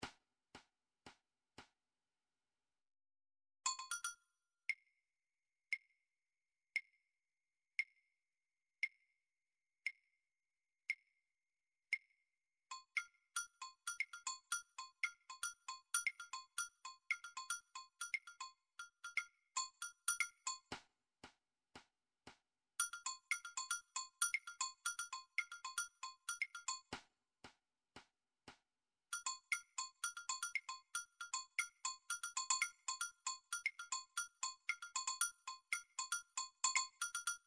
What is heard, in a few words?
drum trax per